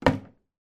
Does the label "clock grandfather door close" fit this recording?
Yes